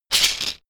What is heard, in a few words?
shaker wood rough hit double hard